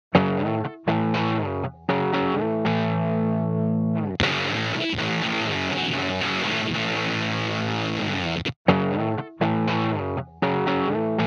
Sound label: guitar